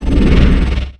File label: attack; snape; game sounds